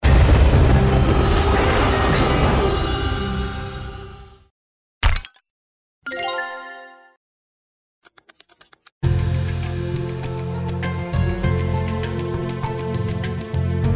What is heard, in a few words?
jackpot Scarab Sounds; Burning Hot 6 Reels 40; EGT